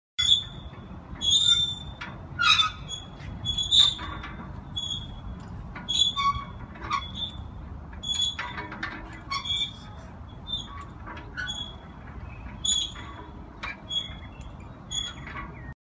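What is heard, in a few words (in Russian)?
скрип качелей во дворе гул; Звук качелей раскачивающихся на ветру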